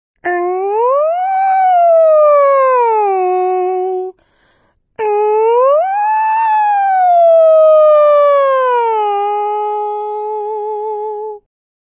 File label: ng siren